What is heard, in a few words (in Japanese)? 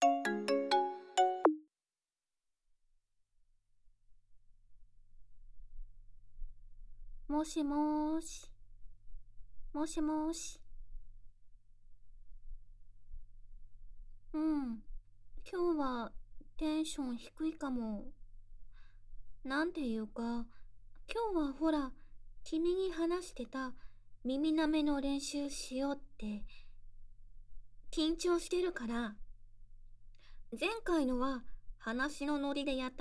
耳舐め練習しよう